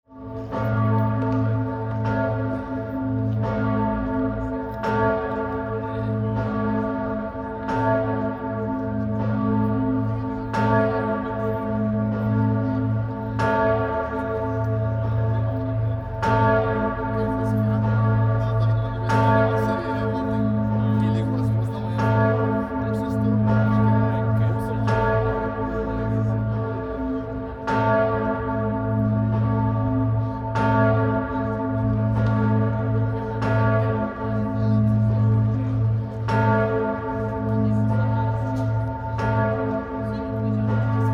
church bell

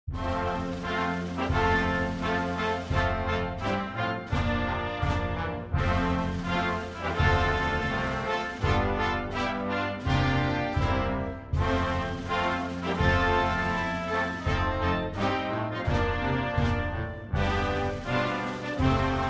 anthem

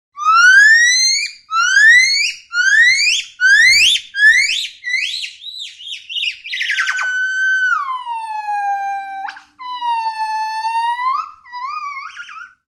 gibbon

